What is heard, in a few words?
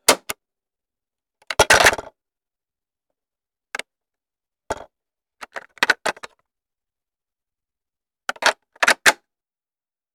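sound of clicking on a tape recorder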